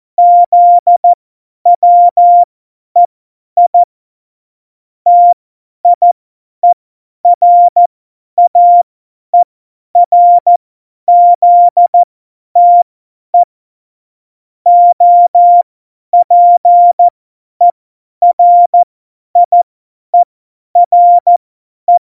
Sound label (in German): Witz, Morsekurs, 50 F70; Witze